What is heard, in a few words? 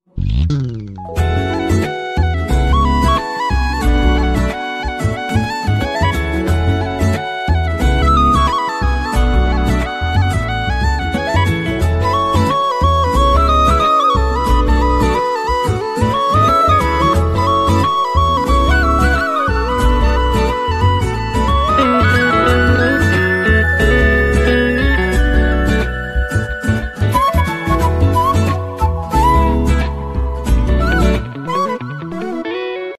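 song instrumental background music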